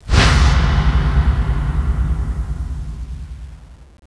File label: scream; cstrike